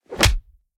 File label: designed punch simple clean deep; fvtt sonniss gdc audio